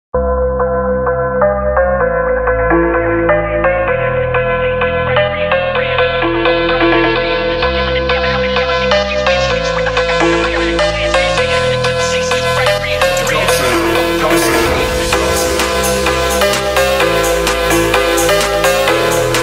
phonk house version

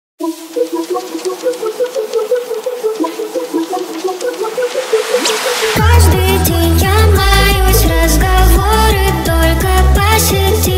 hardstyle remix

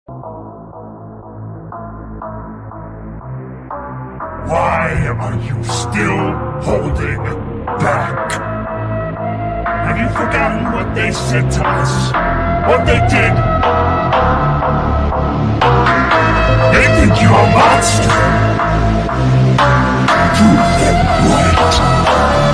phonk; aatrox

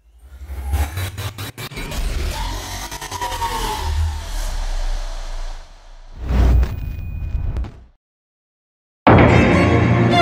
epic motivational rap beat hard uplifting type

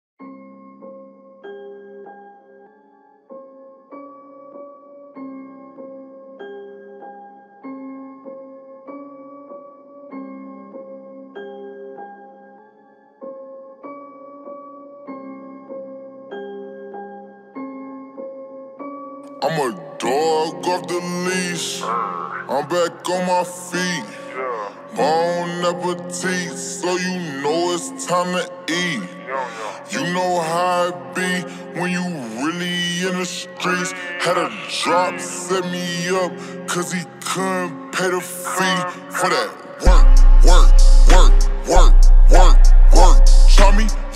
31 35hz screwed low bass

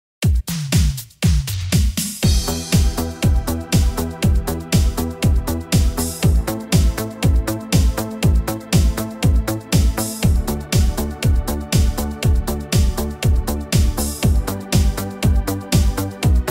Korg Style Italo, Korg Pa4x

